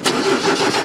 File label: ignition; AssaultCube; Alien Stars; American Muscle Series